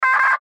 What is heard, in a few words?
Radio Morse Code; Weak Signal Letter D; Military; Warfare